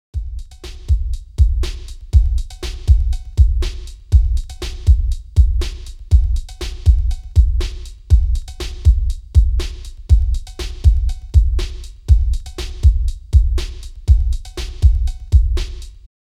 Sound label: bass beat